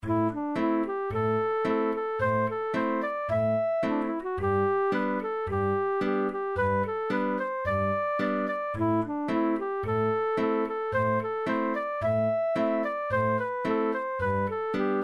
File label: Cold Frosty Morning 55bpm, fiddle